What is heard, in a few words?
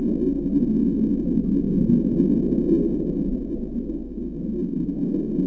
underwater loop; Soldier Of Fortune II Double Helix; XBOX; ambience